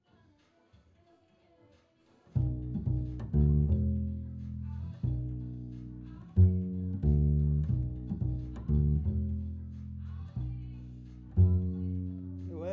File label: Bass Mic